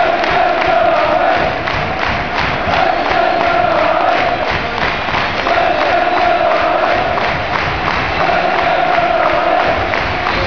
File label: fergusons army, Chants; Manchester united